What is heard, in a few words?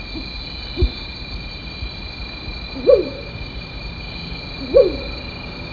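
mottled owl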